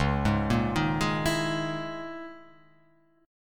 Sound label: C sharp Minor 6th Add 9th C sharp